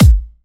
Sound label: Bassdrum; Vengeance Samples